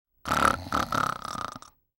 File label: snoring